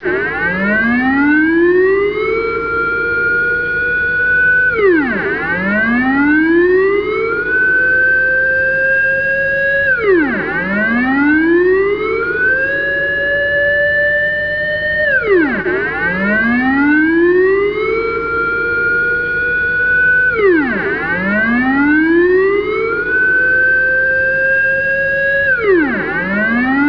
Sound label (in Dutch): luchtalarm